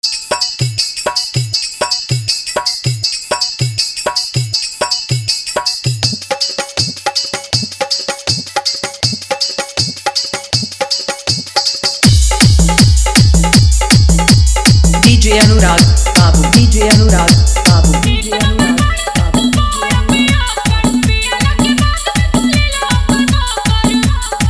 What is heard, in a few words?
Bhojpuri Dj Song Hard Dhollki Bass Mix